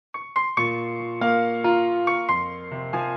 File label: Piano Version